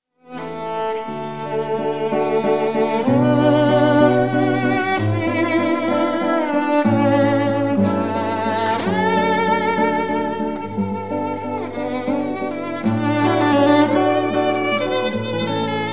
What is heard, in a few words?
cantabile for violin and guitar